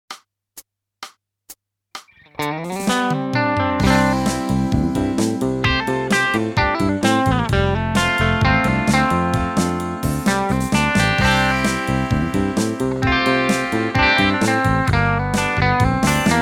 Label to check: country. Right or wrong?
Right